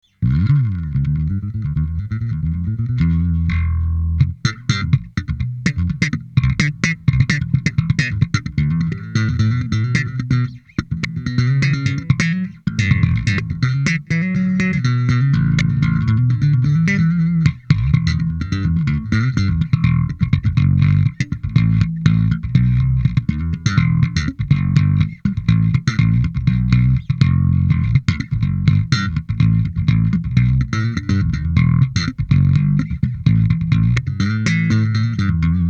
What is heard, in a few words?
Heavy Funk Slap